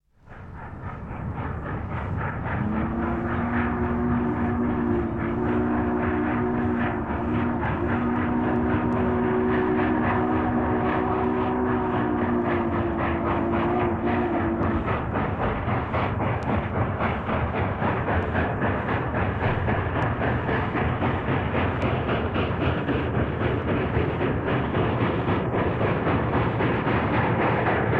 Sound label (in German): dampflok big boy - union pacific; Bahnhof